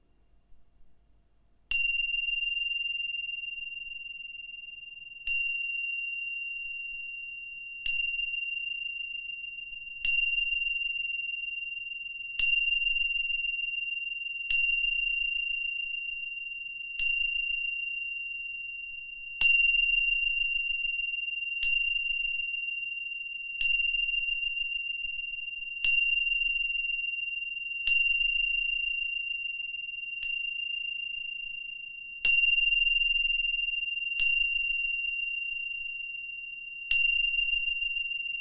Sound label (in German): Zimbel